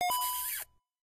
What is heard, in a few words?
heart pickup; Adventure Time - Angry Betty; ThrillGames